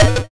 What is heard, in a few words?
YM Arp B; chip hits; arps; CHIPSHOP DELUXE